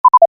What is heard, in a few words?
Sci fi beep off, Scifi Beep: Off; Communications; Stuart Duffield